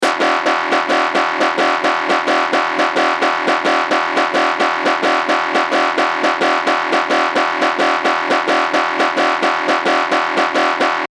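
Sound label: 174 synthloop, techy and aggressive; Tech Flavours; Drum & Bass Sample Pack inspired by the sub-genre of Tech Step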